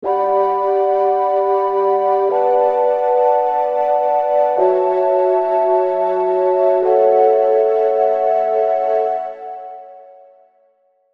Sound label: serum pads; chiqui audio